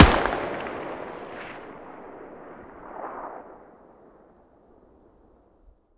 mg muzzle mono far, weapons stationar

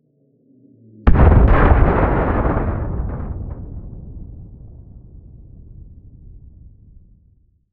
explosions helicopter distant; STALKER; IWP

